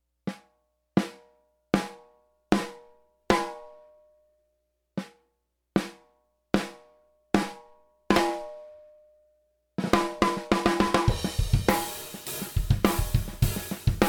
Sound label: 6x13 Brass